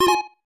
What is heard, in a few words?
Reel Stop; Hisshou 777 Fighter; SNES; Trianof